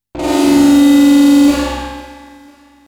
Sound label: Aliens Monsters; FXHome